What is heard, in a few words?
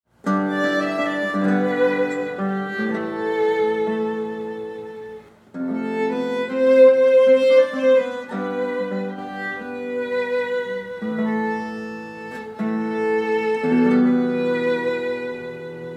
guitar arranged for violin